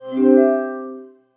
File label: Startup; Microsoft Office Hub